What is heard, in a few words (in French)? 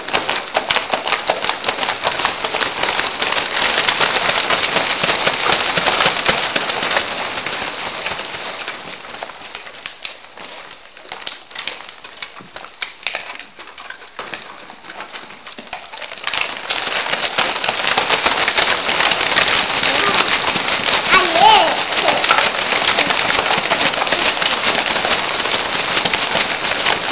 pluie avec les sacs et papiers